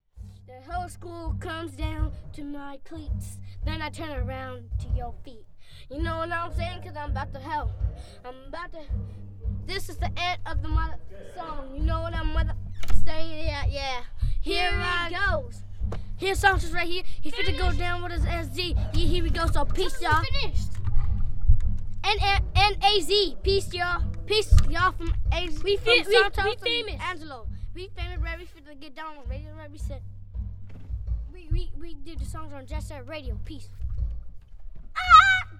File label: Rap